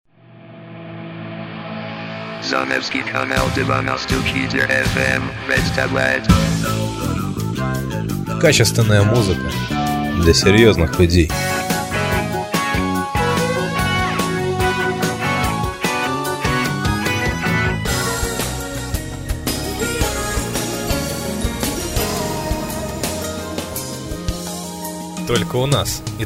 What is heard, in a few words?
jingle